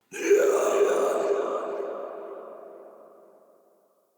Ghost Pain, Vocals; Characters; Enemy; Nightfold Test Range Ghosts

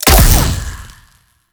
Rifle Shot, Weapons; Warframe; Wisp Rifle

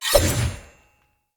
Throwing Knives Throw Heavy

